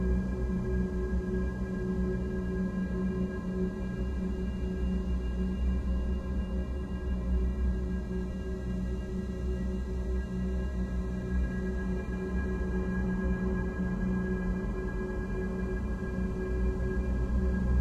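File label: Pause drone loop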